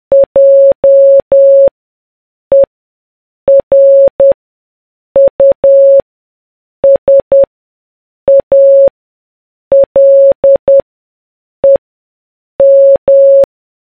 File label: morse